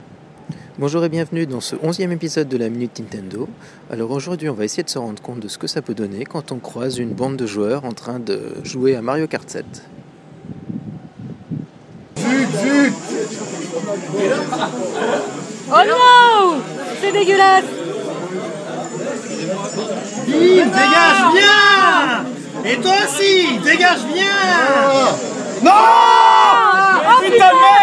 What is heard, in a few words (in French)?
ambiance mk7 a la dsinparis